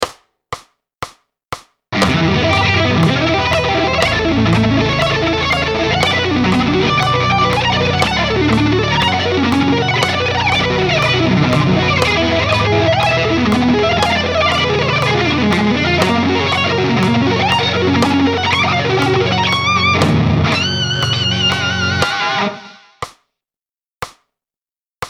sweep etude, guitar

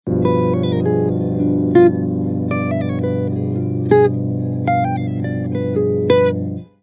Lick

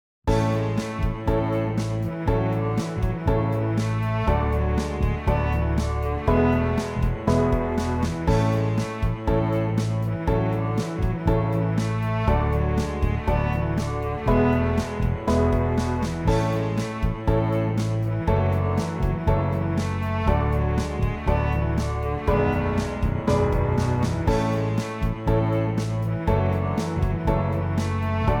Chord Voicing for Bass

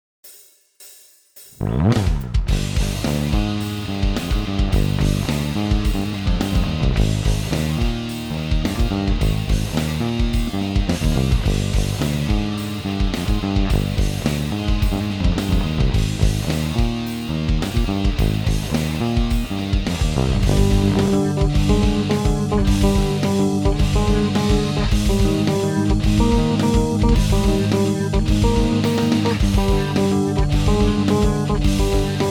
Groove & Solo G Phrygian

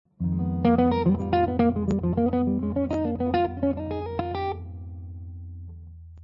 Locrian nat. 2 Jazz Lines, guitar

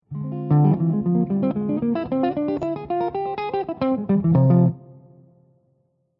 Locrian nat. 2 Jazz Lines, guitar

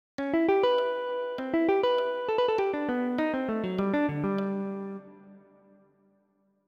Lick

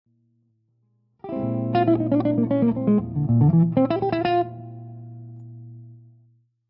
Lydian Dominant Lines

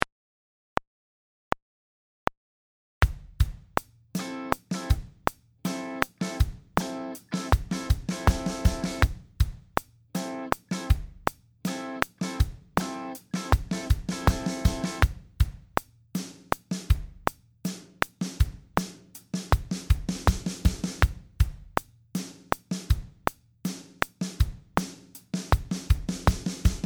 groove r&b

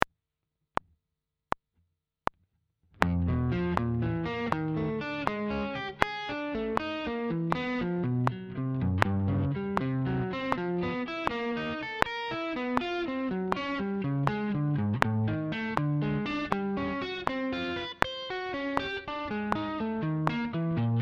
slow 80bpm

